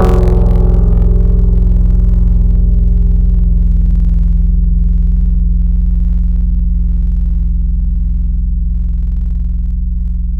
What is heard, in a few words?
Hit, Tropical Mallets; Synthetic's Serum Collection; SparkPackers